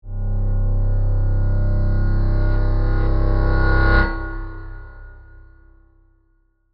Synth Stab Reverse, Production, Element, Synth, Stab, Reverse; Stabs; Sound Design; BlastWave